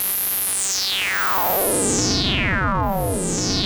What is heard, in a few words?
Moog G3; Hollywood Edge; Giorgio Moroder Rare Synthesizer Collection; MLTI.MOOG